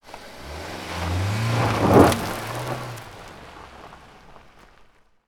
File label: Fast Drive Away On Dirt Road